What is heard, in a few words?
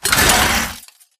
Mvmt Servo Walk; AI Zombie Stalker; Titanfall 2